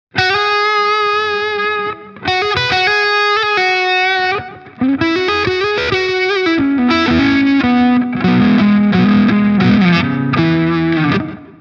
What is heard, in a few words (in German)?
Harley Benton HP 42 Signature Hals verzerrt